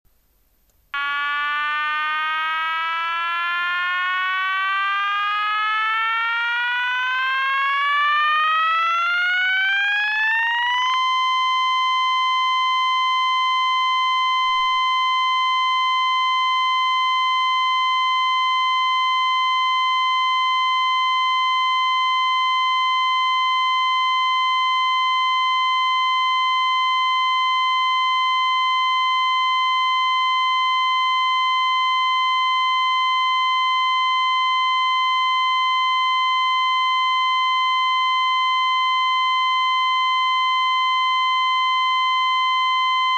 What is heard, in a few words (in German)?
ton, Signalgeraete